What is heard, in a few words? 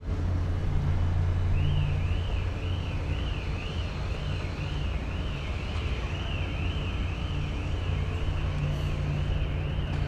boat ship ferry arrive dock